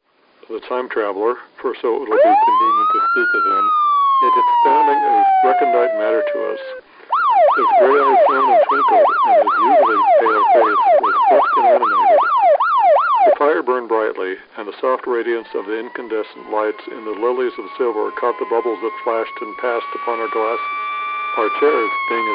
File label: various noises fm